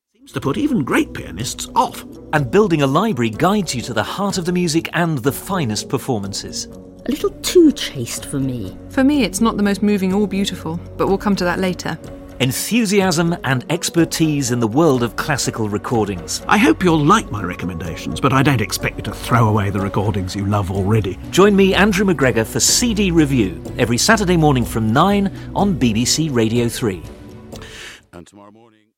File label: string quartet, quartet in f major for strings; Maurice Ravel; Gildas String Quartet